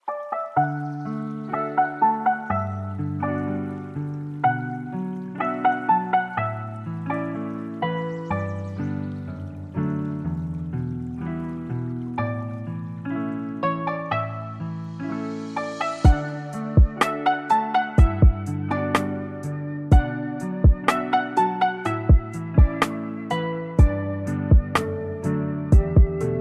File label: lofi; konoha